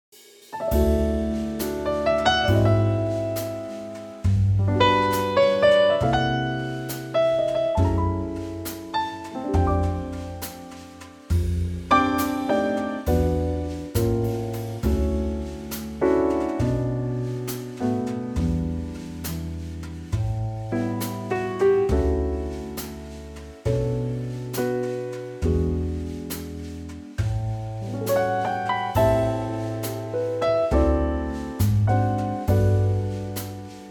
D Trio

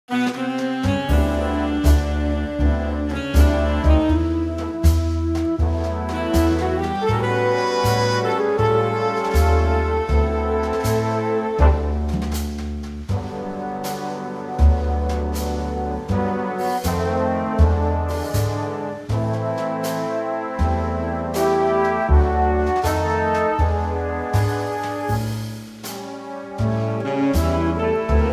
Big Band G

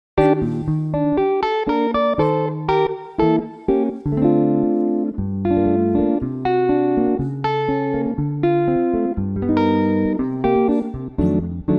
Bb Gibson Gtr

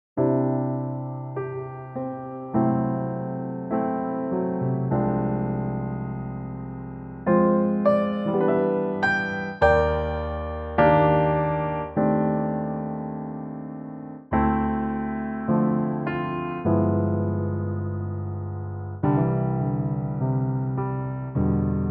C Piano

